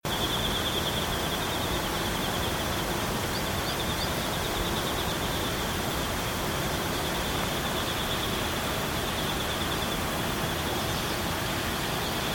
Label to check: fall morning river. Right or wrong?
Right